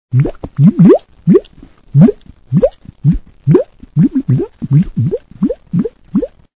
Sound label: bubbles